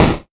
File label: Snare, 8-Bit; Games And 8-Bit Kit Volume2; atomsplitteraudio; chiptune